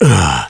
pain

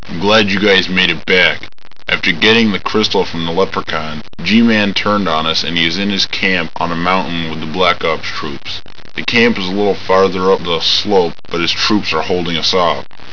grunt; svencoop; leprechaun

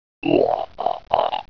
die; svencoop; portal house; aberration

